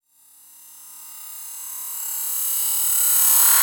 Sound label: Reverse Cym, Cymbals